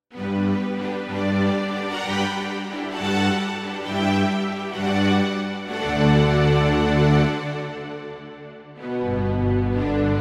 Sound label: Strings